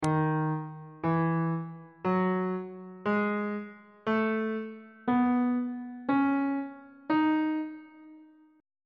d sharp locrian mode note positions

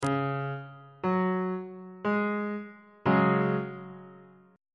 d flat suspended 4th chord

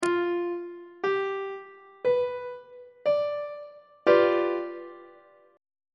g dominant 7th 3rd inversion on treble clef

